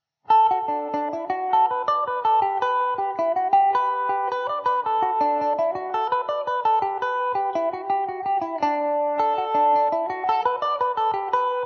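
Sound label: D major, irish mandolin; Epiphone Mandobird VIII electric mandolin; Arthur John Donnelly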